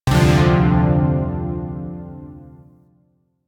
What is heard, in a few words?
Shawzin Min Chord D; Chords; Warframe; Zariman